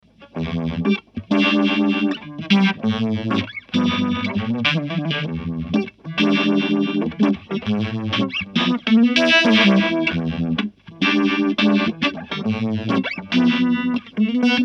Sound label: vibe fuzz